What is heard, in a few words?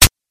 hit none